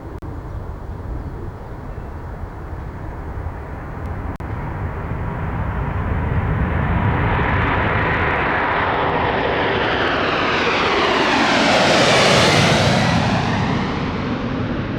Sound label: air